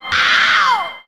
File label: scream ow; Voice